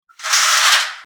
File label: Blinds opening